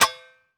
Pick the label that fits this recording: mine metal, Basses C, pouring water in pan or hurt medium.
mine metal